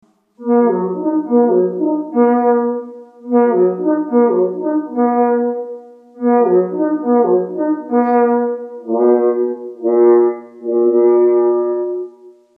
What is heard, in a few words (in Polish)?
naganka naprzod, sygnaly